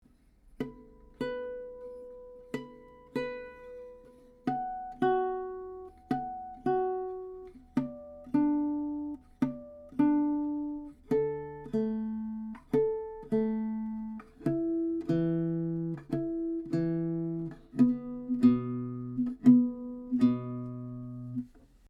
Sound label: Harmonics, Guitars; Baroque; Intonation Test (Harmonic / fretted note @ the 7th fret); Mazarand; OA-1 (Oliver Archut) tube mics into a Trident 88 recording console, using Metric Halo ULN-8 converters